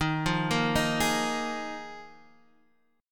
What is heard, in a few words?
Eb Suspended 2nd Suspended 4th, Guitar Half Step Down